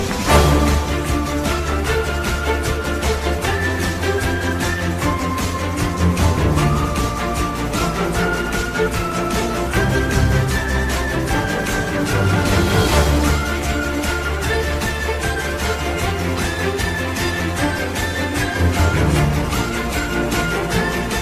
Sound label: drums; Gravity Falls